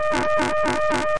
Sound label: crackle alarm; Alarm Sounds